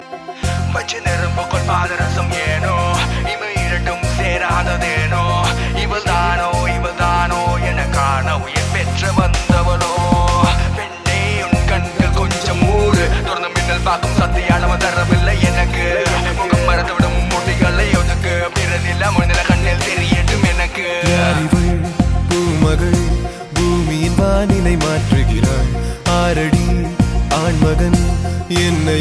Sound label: Tamil Rap